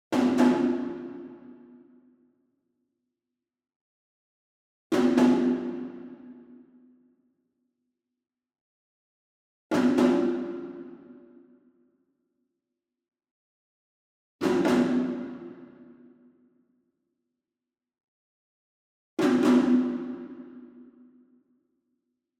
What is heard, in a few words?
ENSEMBLE DRUM Shime Daiko And Chinese Tom Double Far; boom library; Cinematic Strikes Construction Kit